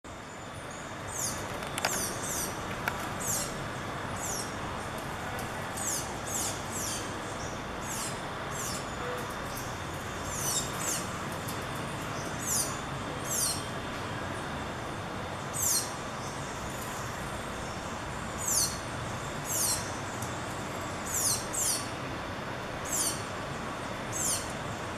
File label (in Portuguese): Canto do sanhacu de encontro branco